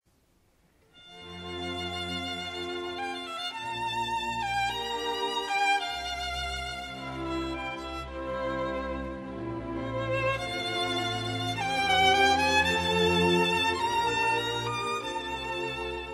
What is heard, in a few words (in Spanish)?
sonido violin